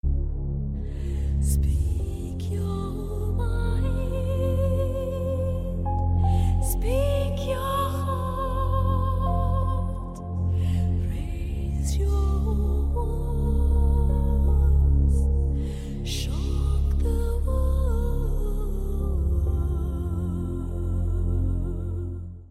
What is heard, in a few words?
Bol Background Music